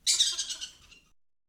pipistrello; Inquisitor 2

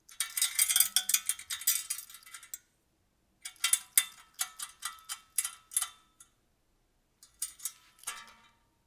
rumori catene; Inquisitor 3

